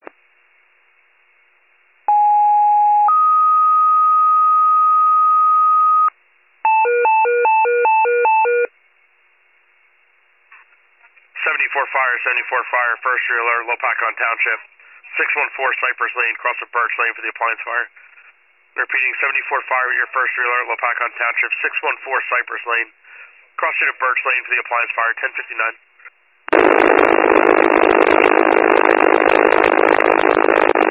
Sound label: FIRE pager alert